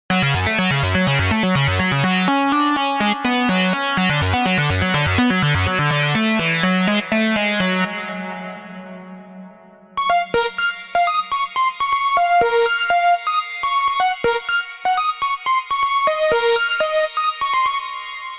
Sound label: Leads Techno; Unison; MIDI Wizard